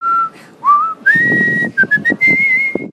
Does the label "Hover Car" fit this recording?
No